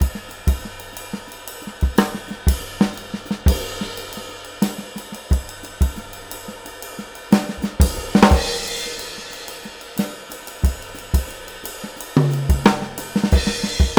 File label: JAZZY 90 STRAIGHT 8THS, drums; LOOPS; breaks; Basement drums vol 2